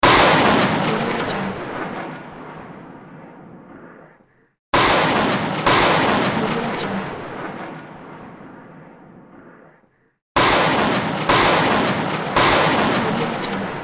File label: rocket launch